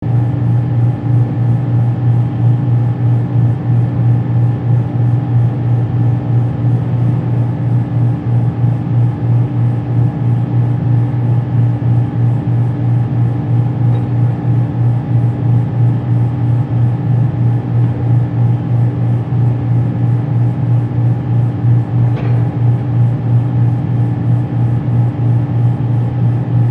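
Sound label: Ambience Science General; Dead Space 3; PS3; Xbox 360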